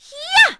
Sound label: Vox Attack; Juno; kings raid; en